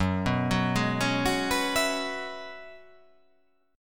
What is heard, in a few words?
F sharp 9th Suspended 4th F sharp, chord